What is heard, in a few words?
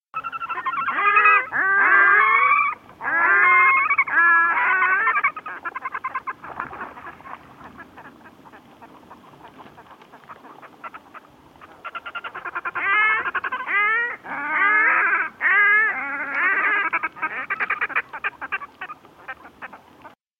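red-necked grebe voice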